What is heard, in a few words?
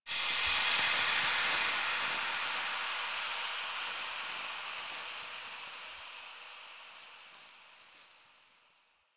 Mask Smoke Close; Deacon; Warframe; The New War; Intro Throne Room